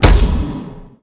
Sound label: Cutscene Bang